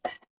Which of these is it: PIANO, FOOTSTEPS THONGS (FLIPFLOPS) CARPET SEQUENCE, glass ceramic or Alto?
glass ceramic